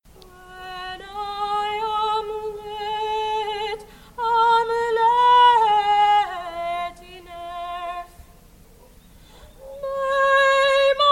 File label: Opera in an ancient theatre; Grece